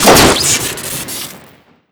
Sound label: rocket fire crit, weapons; tf2classic